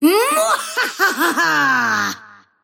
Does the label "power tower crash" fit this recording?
No